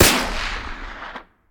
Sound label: fire dist; gmod; arccw uc commons attachments